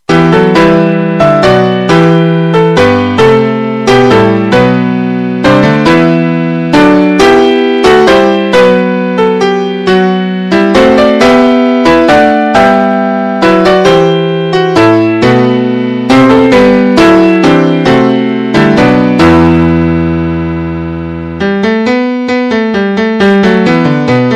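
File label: All Piano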